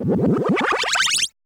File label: GAME BOY, WARBLES; Drums In Your FACE!